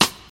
dry snare drum g sharp key, Loudest frequency: 3603; sample